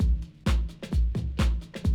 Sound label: 130, Classic DrumLoops